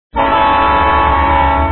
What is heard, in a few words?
horn